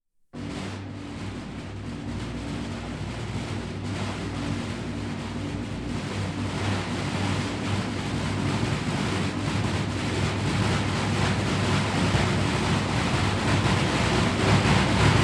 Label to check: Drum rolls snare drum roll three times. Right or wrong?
Right